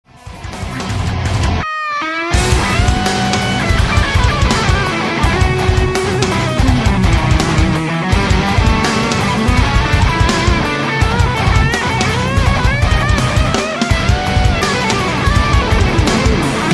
solo

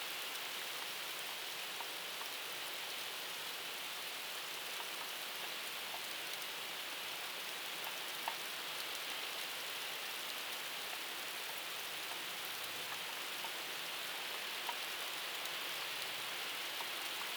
London Rainfall; Calming Sleep

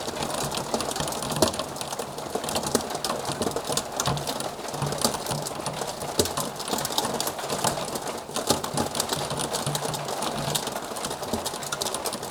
Rain Brittle Glass Rain; Calm Weather; Nature Sound; Sample Rain Library

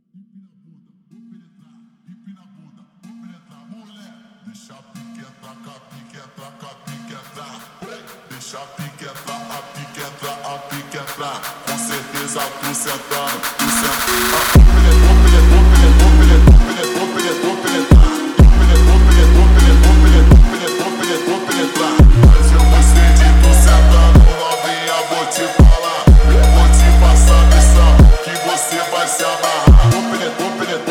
FUNK

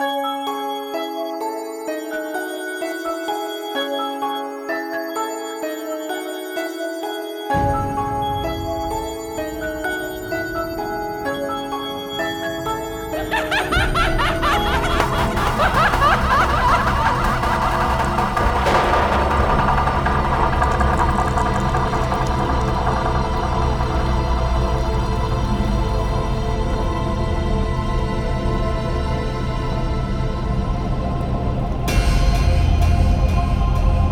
Haunting Halloween Rain

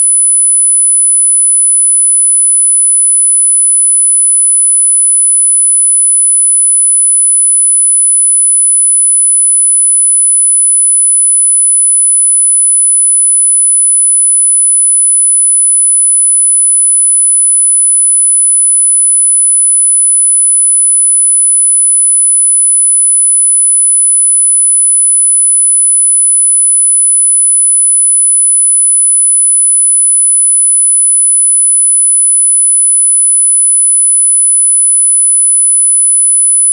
10-K-Hz High Frequency Sinus

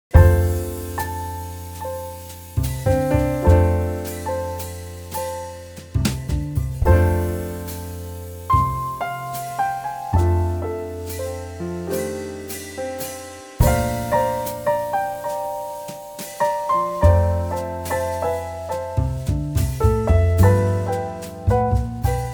Jingle Bells Piano Version

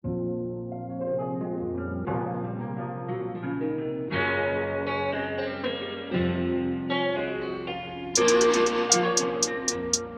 dark guitar trap beats

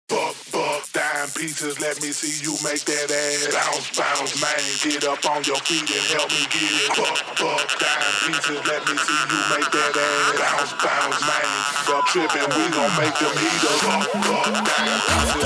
28-31Hz Low Bass

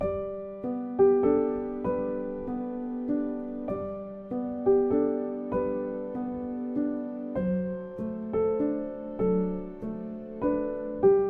Lullaby, Sleeping Baby Music

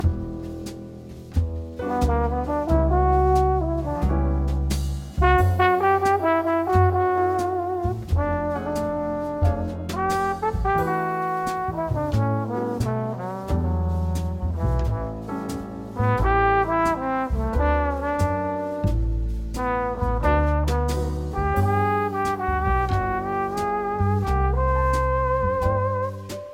Shower Jazz

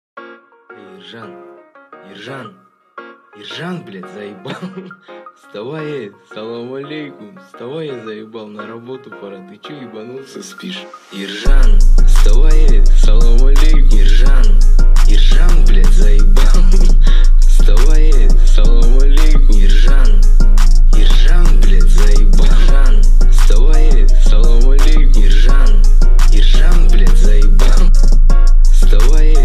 Sinus 28Hz Low Bass

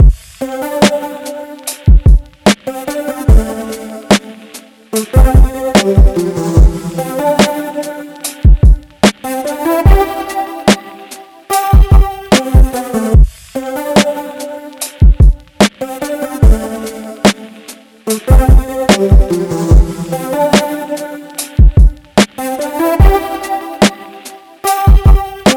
Lofi Hip Hop

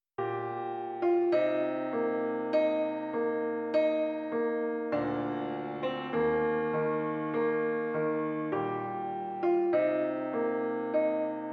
instrumental

